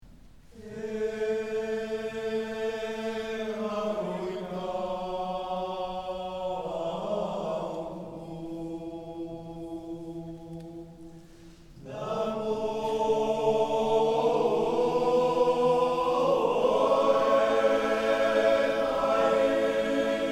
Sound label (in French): musique